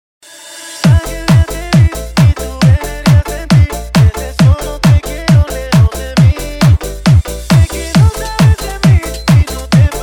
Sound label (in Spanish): reggaeton